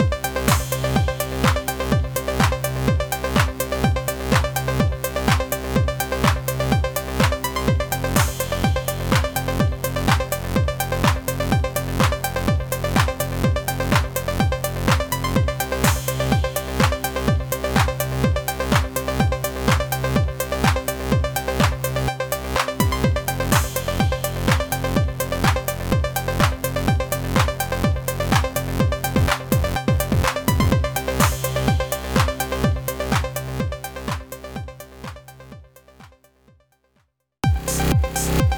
Electro House Pop

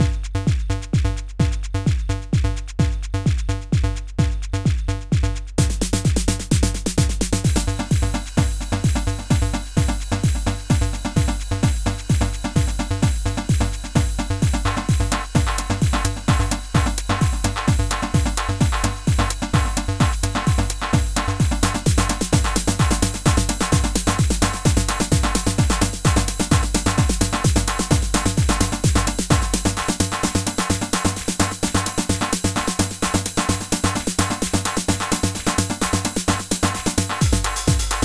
techno polymeter and house shuffle

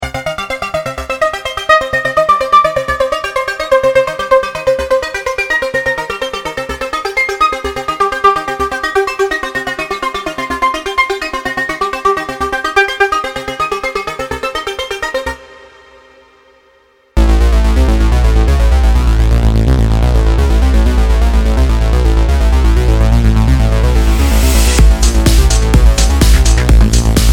techno collection

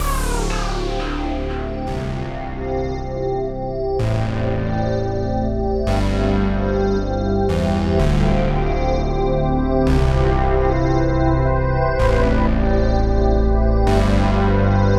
Synthwave

